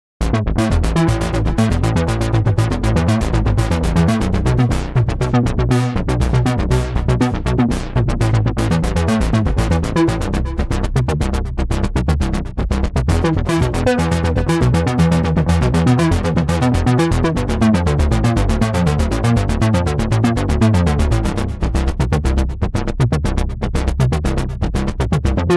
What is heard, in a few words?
Voltage